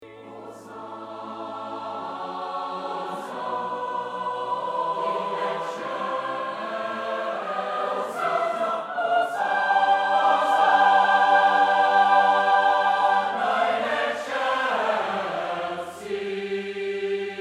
Mass in G Minor, Osanna

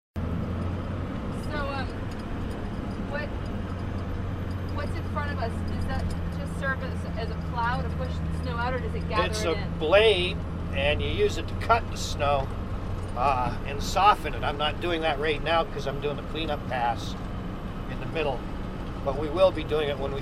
outdoors; Sugarloaf

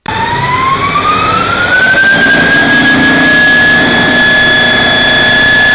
spinup; kart

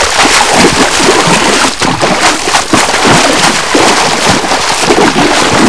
thrashing

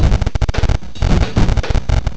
drums

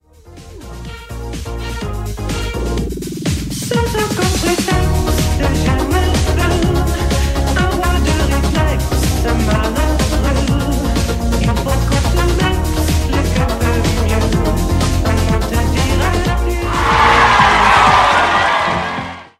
party Music Applause

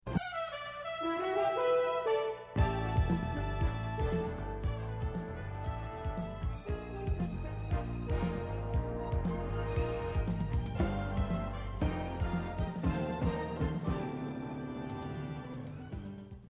bumper bed

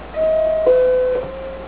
dingdong

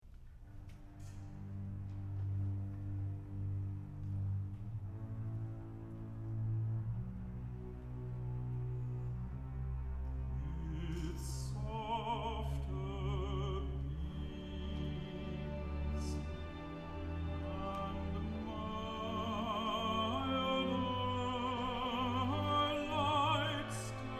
Recitative; Uriel; In splendor bright; creation

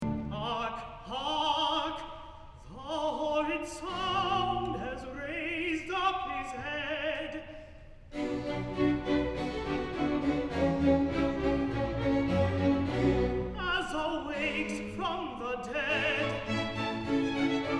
Recitative; Hark, hark

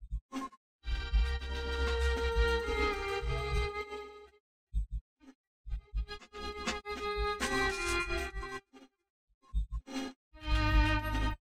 Violin Mimiced

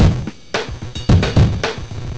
3 drums

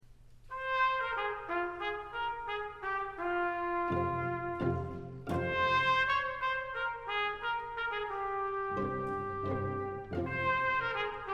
Concert Choir; MIT Concert Choir; Thomson: Southern Hymns: Mississippi

